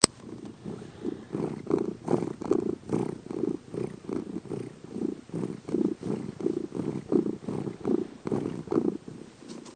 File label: Purring; Ajax